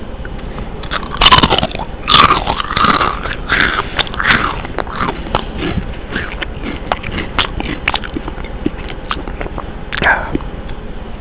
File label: chips